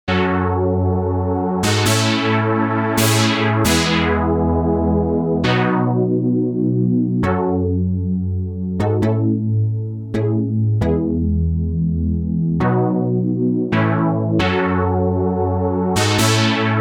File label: Trance Dry 2 Wet; TranceVision